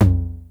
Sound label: 909 LO TOM, TR-909; Hollywood Edge; The Big Bad Beatmachine